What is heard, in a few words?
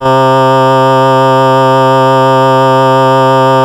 Casio VL1 Violin; Solo-Synths; Giga Samples Collection